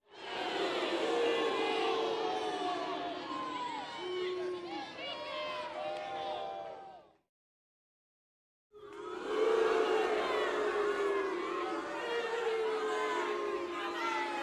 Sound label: Crowd 100 Boos Yells, medium int